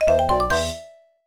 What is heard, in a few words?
Jingle Album Card Get; San-X Land Theme Park de Asobou; DS